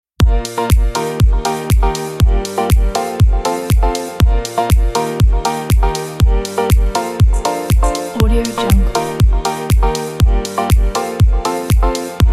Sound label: happy summer time